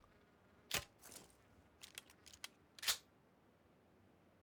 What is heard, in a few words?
S686 reload